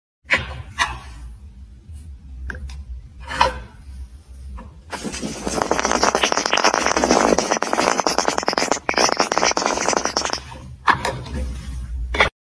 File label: Toothpaste, خمیر دندان